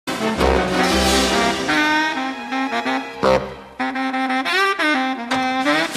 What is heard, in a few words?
solo